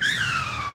Skid; Classic SFX; musicradar; cartoon caper

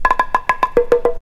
PERC, PERC LOOPS

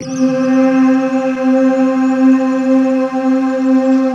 C3, RAIN CHOIR; Best Service; Advanced Media Trax Modern Composer

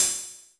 HH-Syn O, Korg Radias

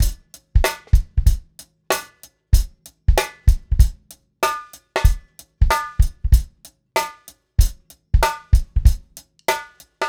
Beat

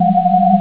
air; Amiga Soundtracker Sample Packs

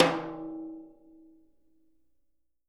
Living Drums, JZ XTOM 1

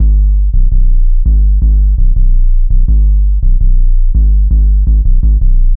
Hard 808, Bass Lines 166.5; Jungle; Zero-G Jungle Warfare 1, 2 & 3; 90 s Sample CDs

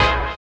STREET; Spectrasonics; Metamorphosis

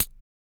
HIHAT, MALE PERC; Spectrasonics Vocal Planet; Jazz FX

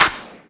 clap, hip; Best Service; Dance Mega Drums

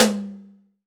TOM, AMBIENCE TOM

